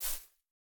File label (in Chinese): 杜鹃踩踏声